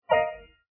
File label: Windows XP Default